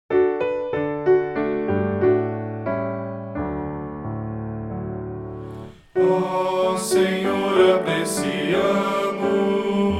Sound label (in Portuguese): voz pian